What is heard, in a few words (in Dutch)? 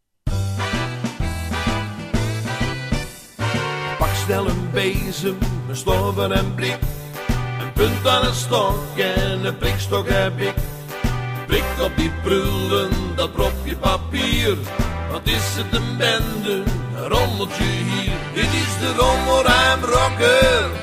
lied